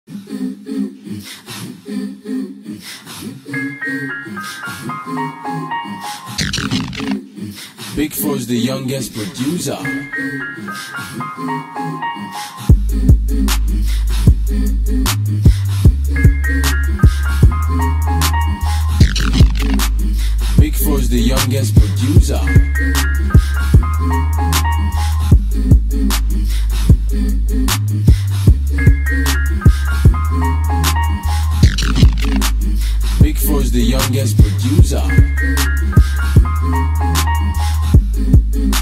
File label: instrumental